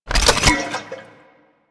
Oil Grenade Create; Warframe; Vehicles; NechroMech; Abilities

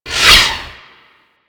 Space Pod Rocket By A, Vehicles; Grineer; Warframe

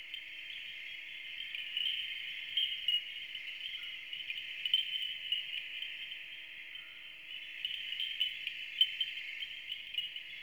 Night Insects Loop

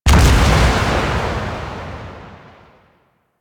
Void Dragon Orb Proj Explosion; Enemies; Abilities; Warframe; Duviri

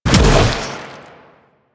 Inf Arm Cannon Shot B; Warframe; Weapons; Infested

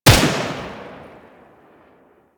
Tommy Gun Shot Initial; Weapons; Warframe; Tenno

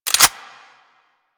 Harpoon Gun Reload; Warframe; Sharkwing; Grineer

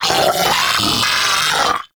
Arachnoid Vocal Player Spotted; Enemies; Vocals; Warframe; Corpus; Arachnoid Hunger

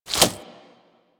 Nunchuck Equip, Weapons; Warframe; Infested

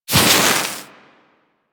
Axe Projectile Impact C; Sundial

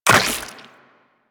Step Goo, Footsteps, Walk; Warframe; Tenno

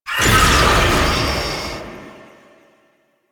Unyielding Gallop Cast; Warframe; Warframes; Dagath

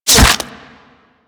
Bullet Flesh, Impacts; Warframe

